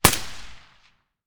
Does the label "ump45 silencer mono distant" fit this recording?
Yes